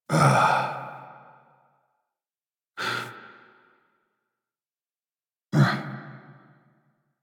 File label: Breaths; Kahl; Warframe; The New War; Grineer Eidolon Shotgun